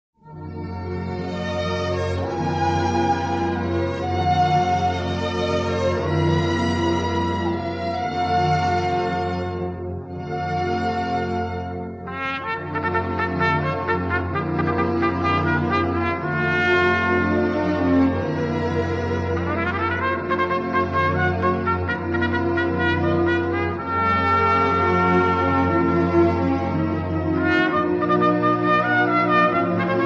is a short composition for solo trumpet and orchestra